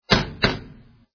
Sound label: lock sound